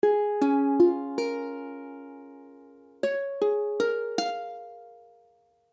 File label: Cis, ukulele; ukulele akordy